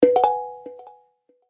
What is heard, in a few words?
Harmony; Itel; S23